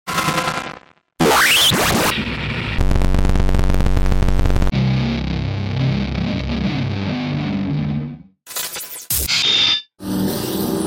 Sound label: Blastwave FX Distortion